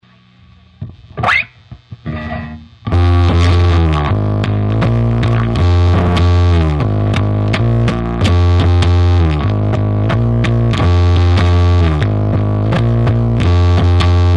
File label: bass